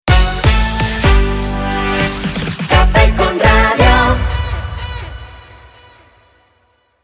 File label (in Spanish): Vocal